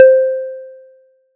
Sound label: SY PLASMO; ModelCycles